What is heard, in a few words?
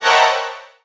ghost spawn; npc